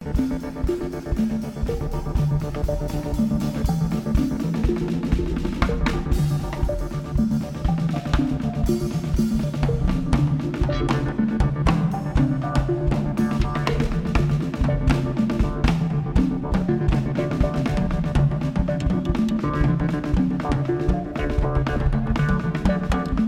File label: Drums